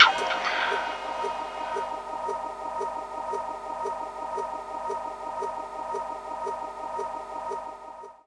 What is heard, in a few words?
SFX VOX; Rawcutz - Nu Forms; Gbm